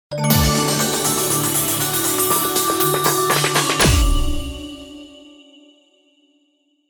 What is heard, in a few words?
Christmas Theme Ident